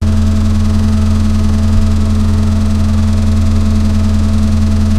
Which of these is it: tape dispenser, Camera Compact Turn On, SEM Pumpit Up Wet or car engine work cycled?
car engine work cycled